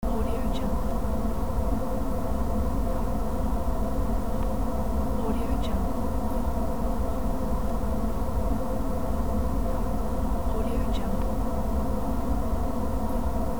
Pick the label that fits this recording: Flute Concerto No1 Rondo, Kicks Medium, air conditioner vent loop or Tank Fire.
air conditioner vent loop